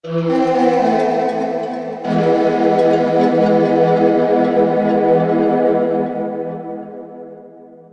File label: Round Over; Iron Phoenix; Xbox gamerip 2005